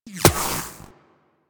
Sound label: Shield Impact